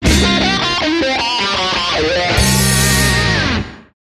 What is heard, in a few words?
Martian Funk, Sting